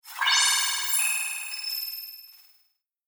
Future Telemetry Glass Delay Scifi Interface Space Spaceship Robot; Sound Design; Tech Sound Effects